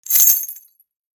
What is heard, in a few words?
Small chains movement rattle